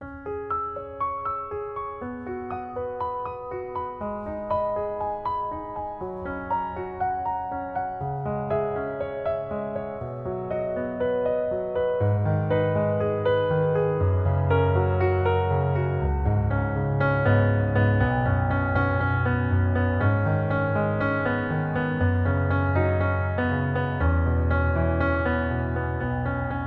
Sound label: Piano Instrumental